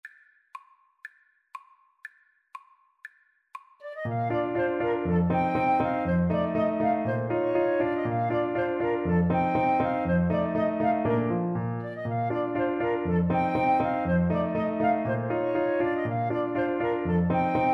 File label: ecossaise, Clarinet; beethoven